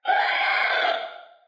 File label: dino screech; SoundsCrate